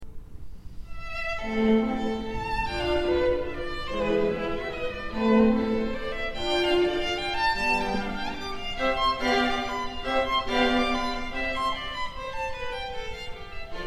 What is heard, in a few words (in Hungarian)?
2 Klarinét